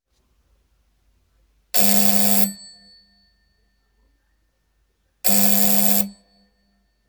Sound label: doorbell